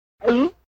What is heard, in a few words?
gulp; mickey